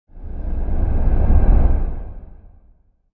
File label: Whoosh